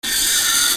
SCIFI

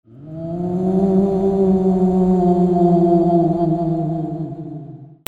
CARTOON

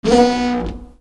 DOORS

